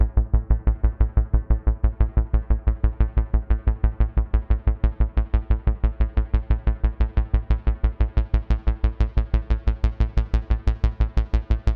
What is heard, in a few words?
Game Music